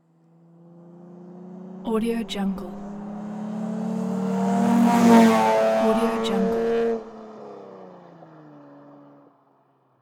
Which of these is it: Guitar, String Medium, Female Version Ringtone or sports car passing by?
sports car passing by